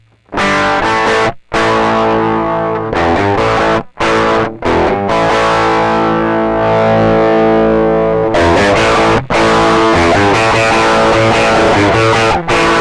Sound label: Boosted Neck PU, Distortion Experiment